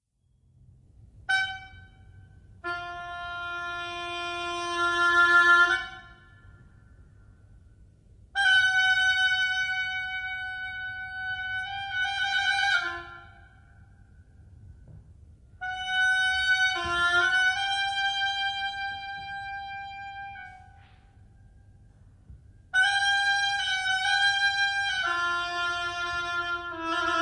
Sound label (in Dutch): hobo improvisatie korte versie